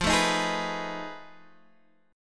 F 7th Sharp 9th Flat 5th Guitar Half Step Down, chord